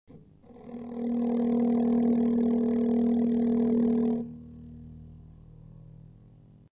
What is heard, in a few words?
39Hz rot, torsion